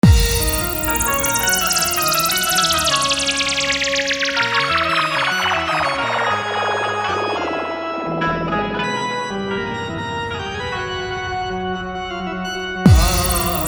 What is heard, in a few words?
Full Dhollki Bass Mix